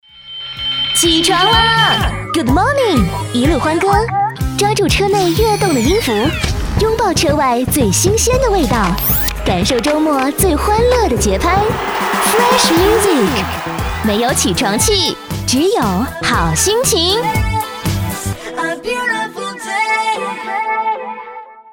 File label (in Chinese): 包装 片头; 一路欢歌